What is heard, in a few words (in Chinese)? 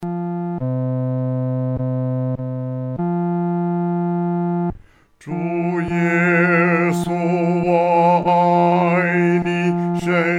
独唱 第四声